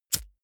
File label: lighter wheel spin flame burst